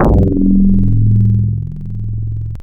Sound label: FM Splat, Digital; Xfer Records Serum; Serum Presets